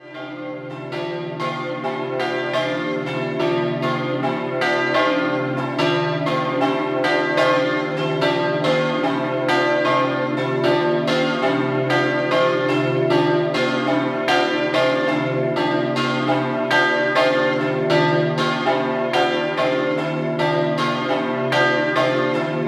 ATTIMIS, campane

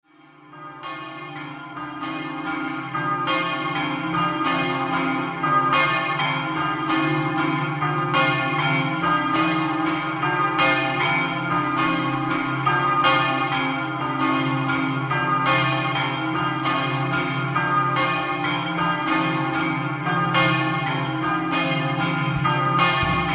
RONCHIS DI LATISANA, campane

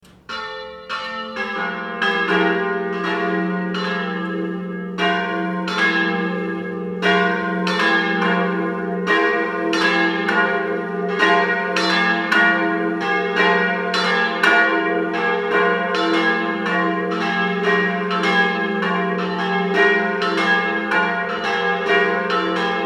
Torre, campane

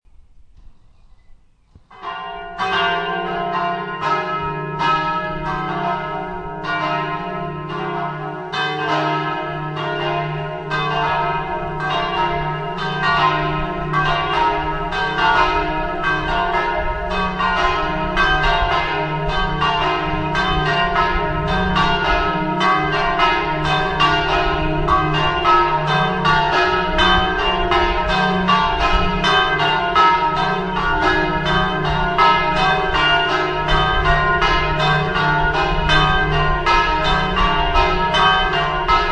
SAMMARDENCHIA, campane

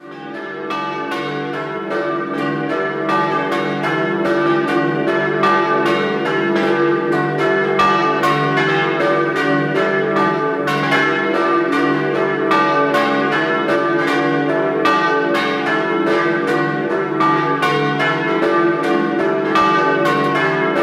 Sacile, campane

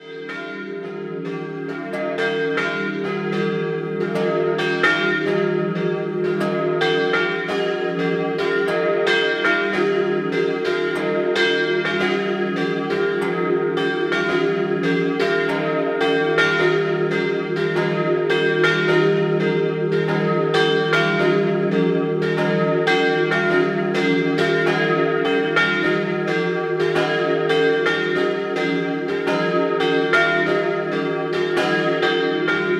Pantianicco Doppio, campane

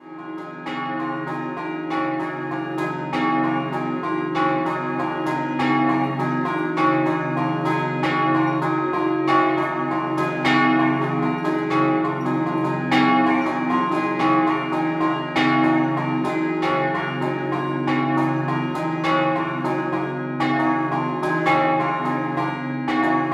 Pozzo Scampanottata

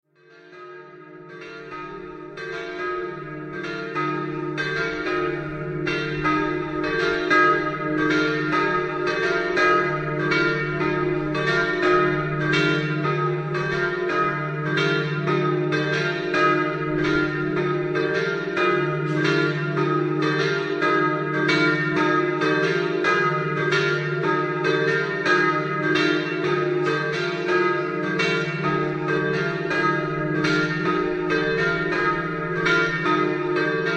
VILLANOVA DELLE GROTTE, campane

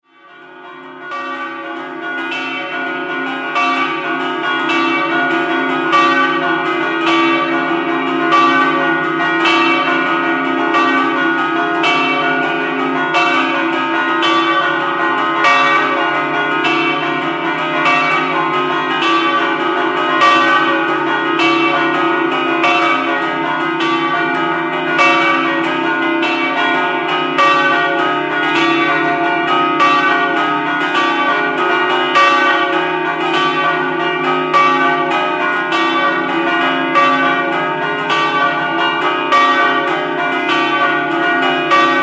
PASSONS, campane

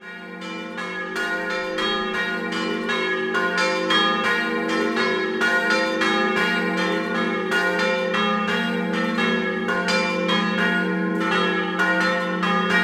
Lestans, campane

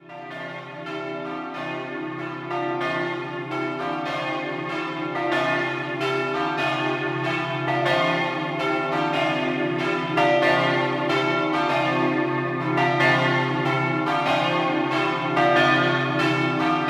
Pordenone, campane; San Marco

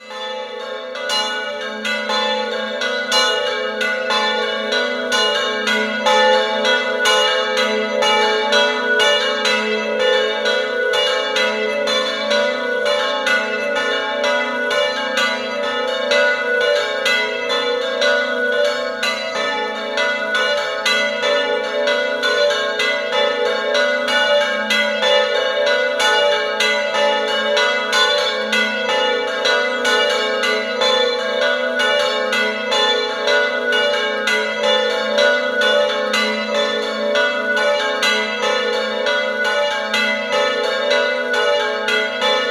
Carbona, campane

